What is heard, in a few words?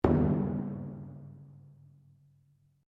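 Drum Taiko forte, Japanese Taiko Drum; Miscellaneous Musical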